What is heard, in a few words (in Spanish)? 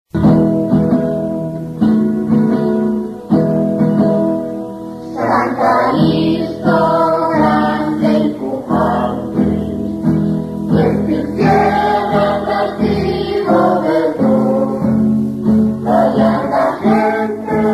himno de san calixto